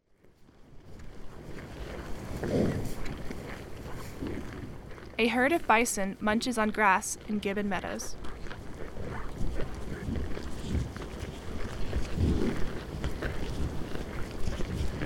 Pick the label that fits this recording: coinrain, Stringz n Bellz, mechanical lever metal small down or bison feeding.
bison feeding